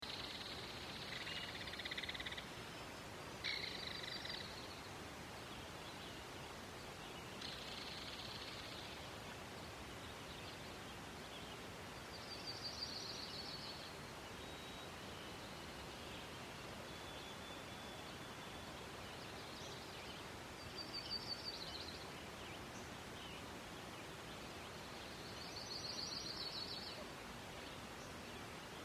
amb normandie; deadmaze

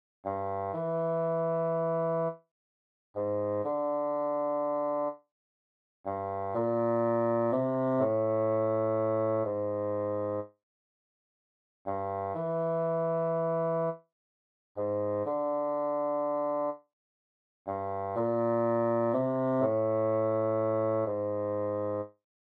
bassoon

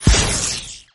elec

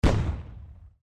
explosion

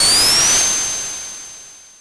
spin; sonic

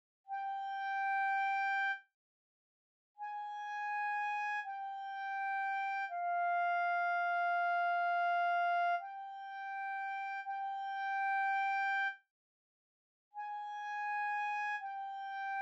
clarinet

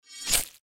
sploutch

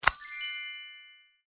bonus

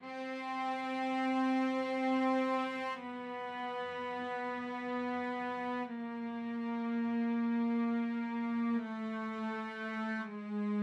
cellos

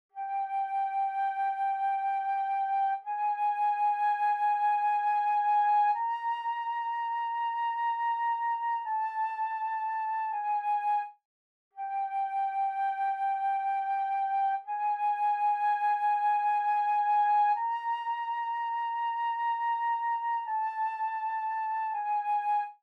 flute

